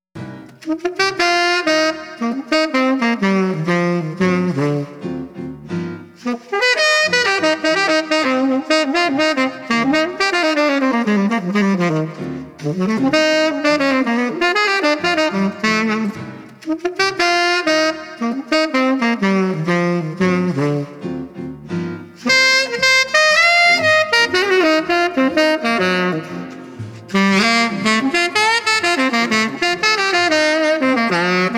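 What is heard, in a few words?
Street Sax Guitar Pleased, 120 bpm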